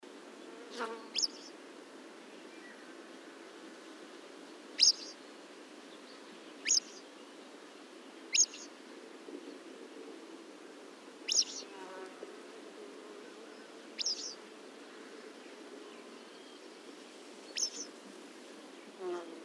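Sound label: blue-headed wagtail flava, birds in flight; seelhaus see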